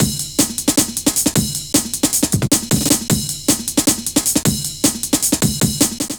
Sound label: drum loops, jungle; Jungle Warfare 1&2